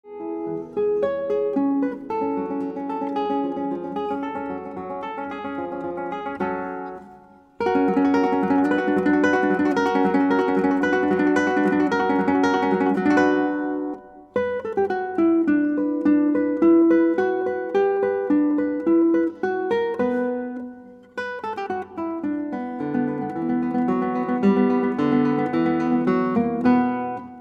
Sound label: Guitar